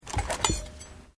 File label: KNIFE KITCHEN PICKUP; Various knives; Kitchen Sound Effects; Kitchen (Sound Effects:Household)